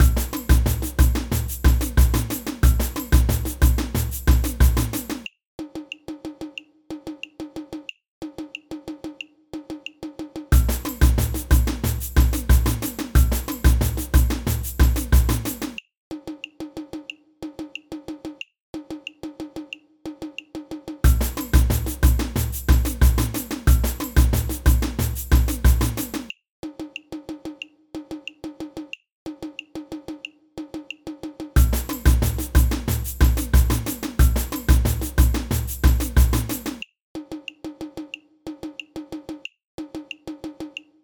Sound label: rep, samba; samba band